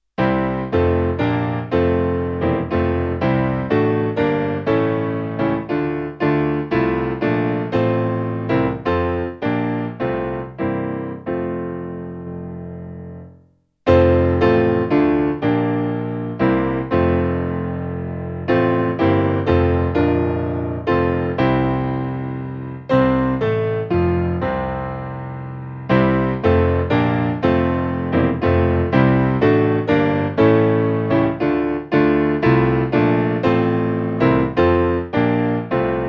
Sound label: Piano, hymn